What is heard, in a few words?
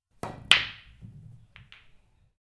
A Break In A Game Of Pool Balls Rolling Around